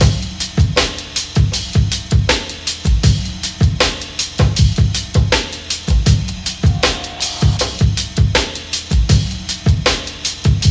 79 bpm 90s hip hop drum loop sample g sharp key, Free drum loop - kick tuned to the G# note